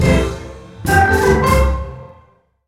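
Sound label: Music Jingle Hello darling Echo; james noirs hollywood crimes 3ds 2011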